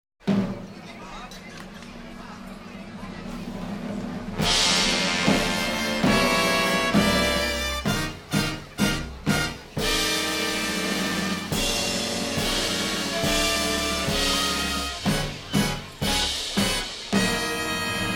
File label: Fanfare Introduction; Rat Pack